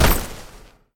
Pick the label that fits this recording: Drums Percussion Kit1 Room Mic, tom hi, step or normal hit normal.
step